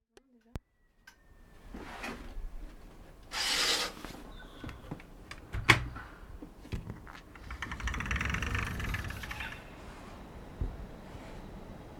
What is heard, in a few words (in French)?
chats; kamiigusa